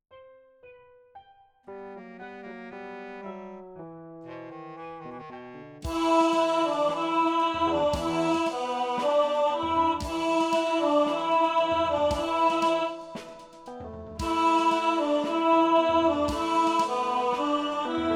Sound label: Tenor Baritone, rehearsal